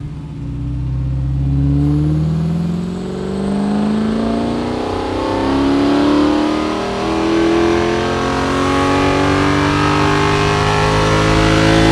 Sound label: v8 Accel; Vehicles